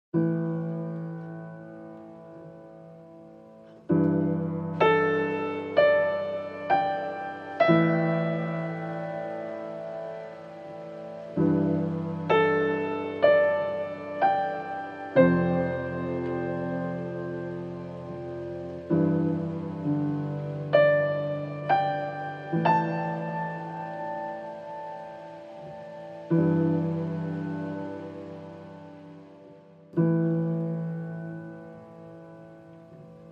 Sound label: Pianos